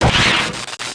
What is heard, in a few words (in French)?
impact instruments